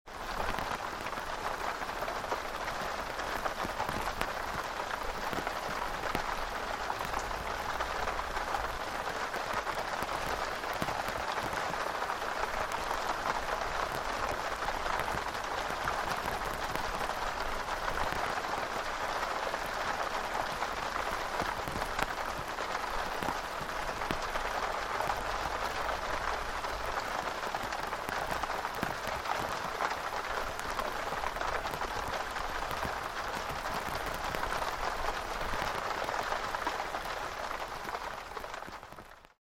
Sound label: Interior of tent with rainfall outside